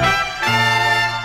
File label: buffered horns